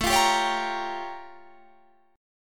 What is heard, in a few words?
A Augmented 7th Flat 9th A; A+7b9 strummed; 1,3,sharp5,b7,b9; 17,20,17,18,20,18